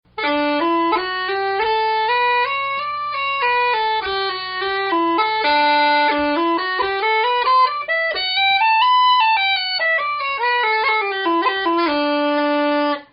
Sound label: scale, uilleann